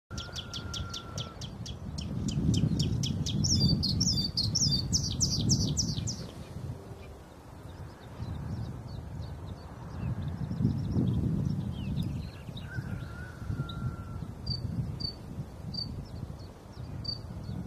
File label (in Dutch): blauwborst